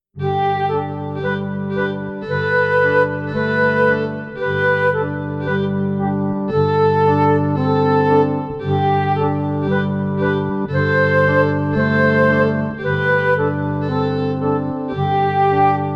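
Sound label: Air de buffons Accordion Flute Violin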